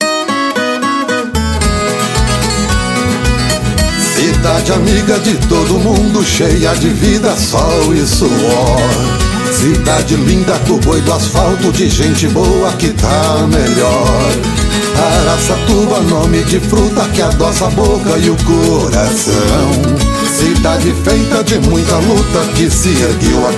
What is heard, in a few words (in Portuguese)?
jingle politico campanha; tema de valorizacao aracatuba